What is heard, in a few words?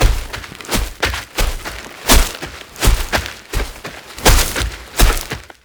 Wolf Walk Loop; Animals; Warframe; Duviri